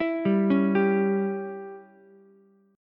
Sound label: C sharp Diminished C sharp, Ukulele; arpeggiated; chord; 9,7,9,10; JGuitar